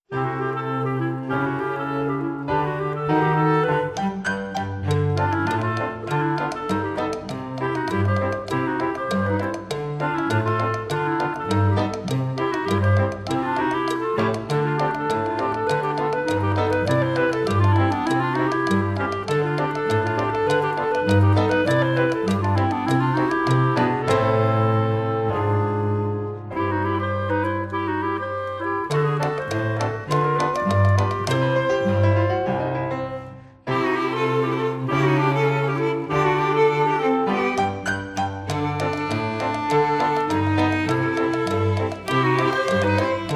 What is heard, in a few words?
Ragtime Play back